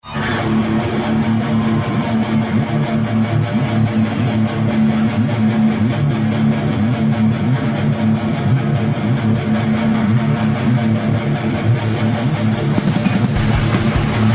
Riff

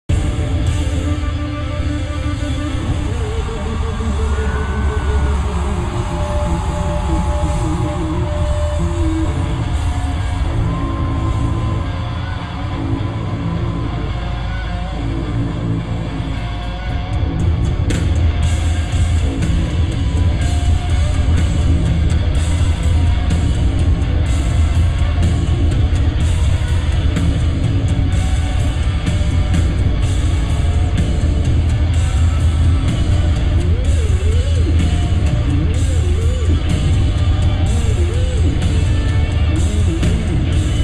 riff

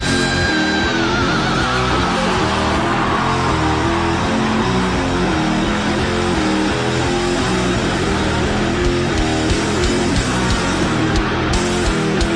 Outro Riff

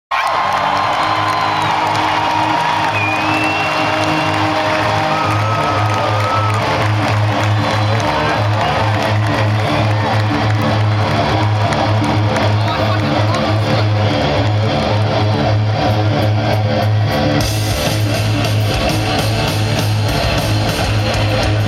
riff

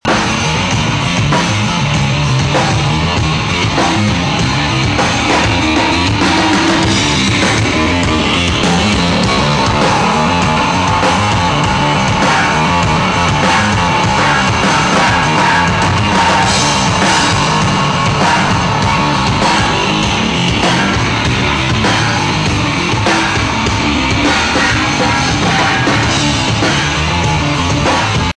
Drum And Bass

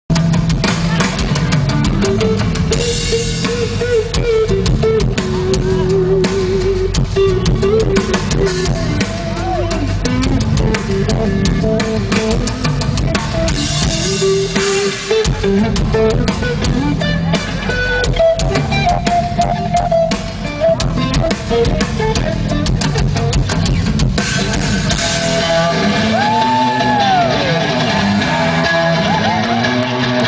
Fire riff

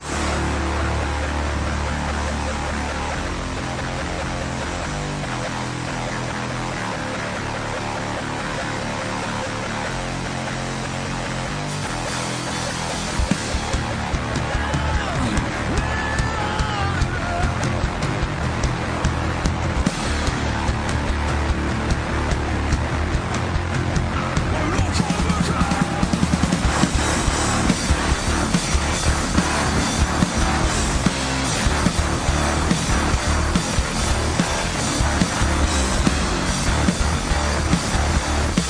Riff

